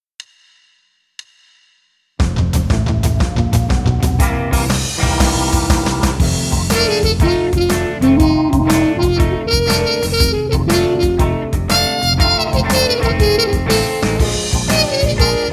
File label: blues tp